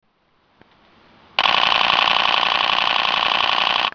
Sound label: 1D X Sound, canon